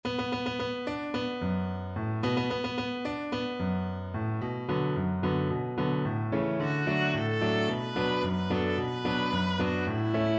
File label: viola